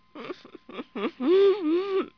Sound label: people